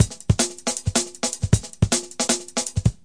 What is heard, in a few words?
158 bpm, drum loops and breaks; flying; 141 to 160 bpm